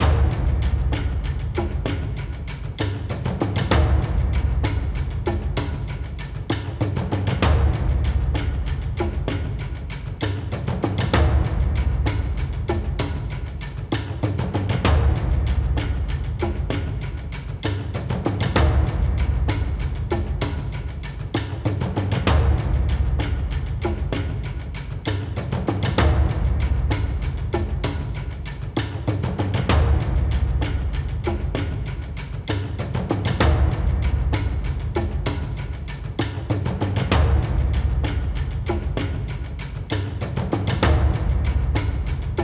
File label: ambience Music; templeofnudges